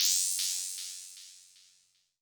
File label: Percs, Elektron MachineDrum; SPS1 MKII